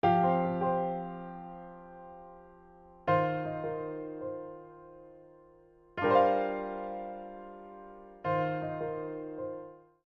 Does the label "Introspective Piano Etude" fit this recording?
Yes